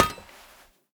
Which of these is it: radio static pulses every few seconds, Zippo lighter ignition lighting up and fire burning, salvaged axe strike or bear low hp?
salvaged axe strike